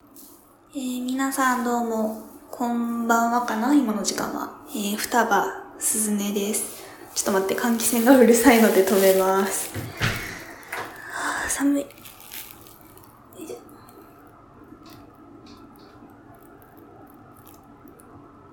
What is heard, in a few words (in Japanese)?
おしっこ実演, ビニール袋におしっこ編